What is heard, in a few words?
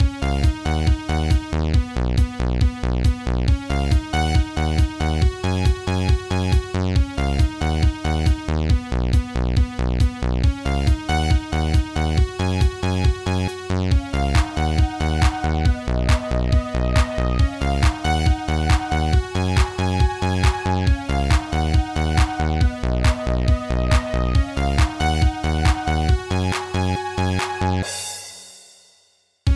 4 Strings